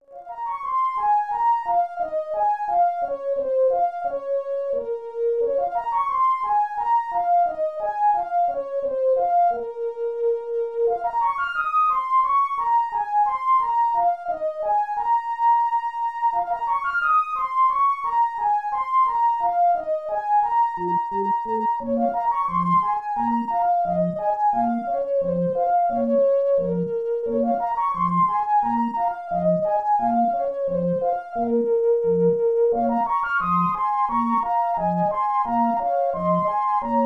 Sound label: Panpipes; Ultima VI; SNES